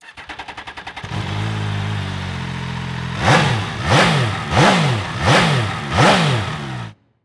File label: dragster r